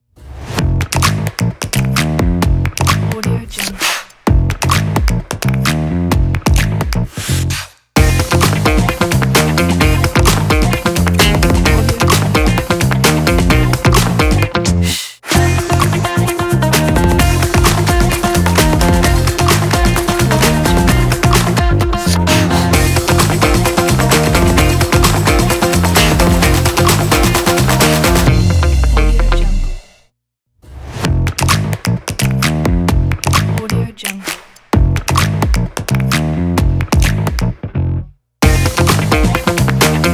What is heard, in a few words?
bass breath stomp ident